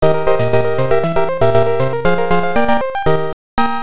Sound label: Round Up [Round Clear]; Fantasy Zone; TurboGrafx-16